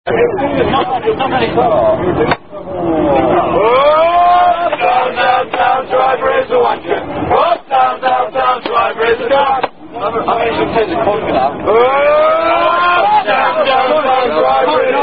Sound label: Rugby Drinking Song; Driver Is A Wanker Song